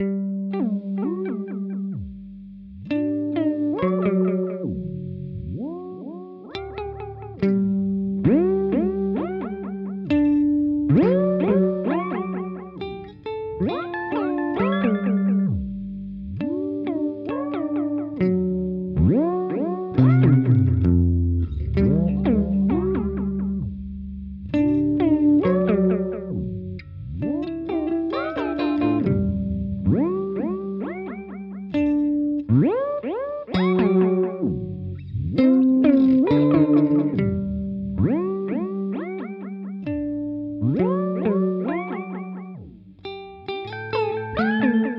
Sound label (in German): Chase Bliss Audio Thermae Step Intervalls